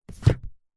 household book large thick set down on top of another; zapsplat